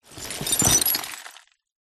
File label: broken glass pieces in cardboard box tip side glass slide; zapsplat